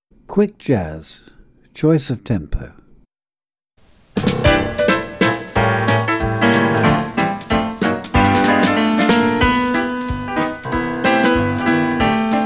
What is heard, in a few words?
Quick jazz choice of tempo, tap advanced 1